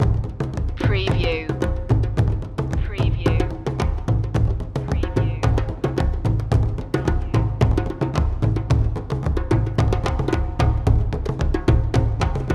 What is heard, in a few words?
african drums bata